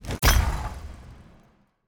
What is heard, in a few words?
enchant, enchantment table; Minecraft; Votive World